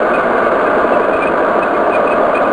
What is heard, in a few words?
moving; M4 7.5.2; M4™ (PowerMac)